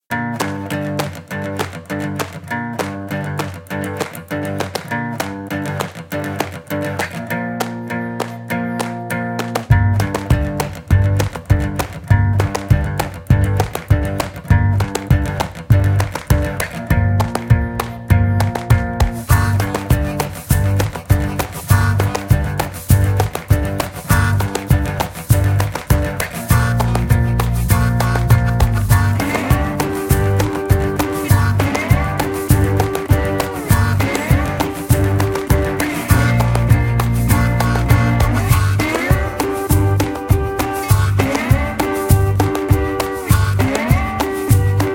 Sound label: Country; farming simulator 17 nintendo switch edition